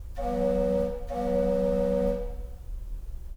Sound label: train whistle